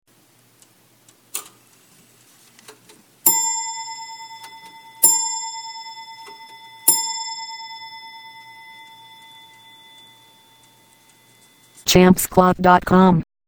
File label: chelsea pillar and scroll